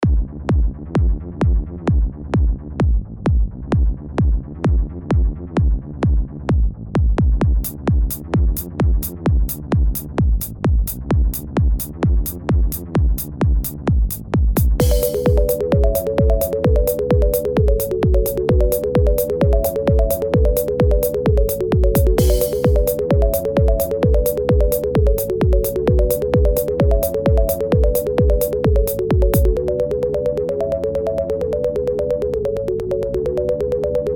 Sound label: space no vocal